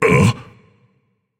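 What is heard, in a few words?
non-verbal surprised; smite; atlas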